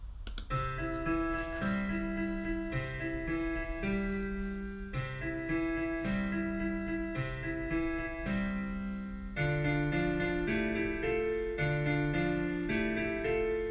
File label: INSTRUMENTAL